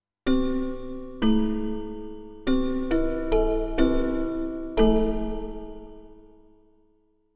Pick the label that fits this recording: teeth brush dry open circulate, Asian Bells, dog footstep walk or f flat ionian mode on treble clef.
Asian Bells